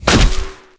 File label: Shock Rifle Retract; weapons; Requests Studio; UT3